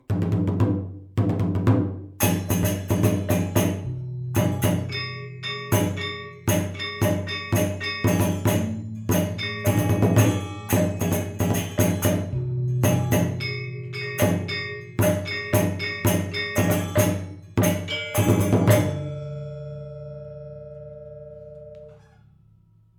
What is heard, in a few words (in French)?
Break Tutti, gamelan